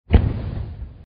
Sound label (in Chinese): 音效 烟花